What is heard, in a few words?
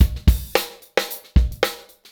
113 HipHop Live Drums